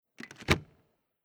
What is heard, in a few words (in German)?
Heizlüfter Dyson Hot AM04 Filterklappe loesen